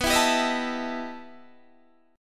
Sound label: E Minor Major 11th B, guitar